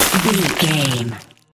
ice spell freeze frost

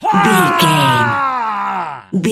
voice male battle shout long

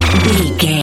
retro teleport warp effect

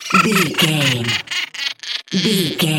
goblin fairy laugh angry

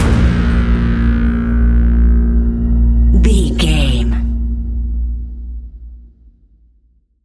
suspenseful nightfall stinger

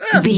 voice female hurt pain

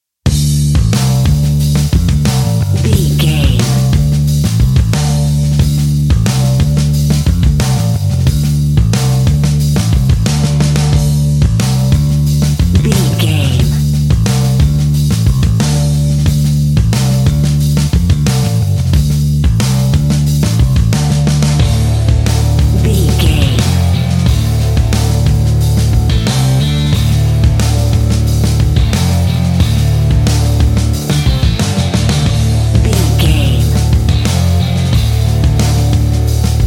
Surfing Vintage Rock Waves; Catch 22 Music Network

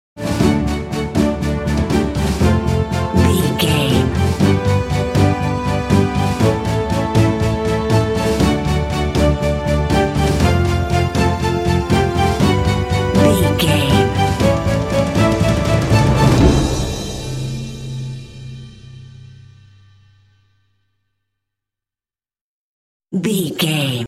sting

